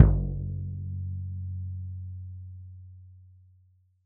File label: Frame Drum Large; World Percussion; Cymatics; Emperor World Percussion